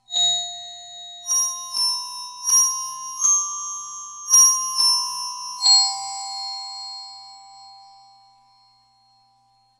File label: Glockenspiel